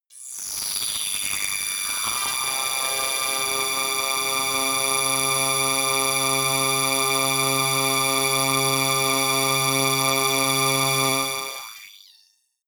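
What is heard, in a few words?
random noise tones, science fiction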